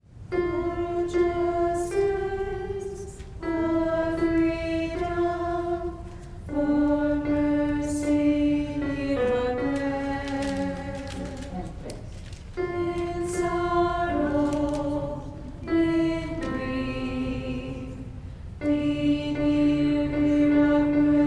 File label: harmony alto and bass; Gather Hymnal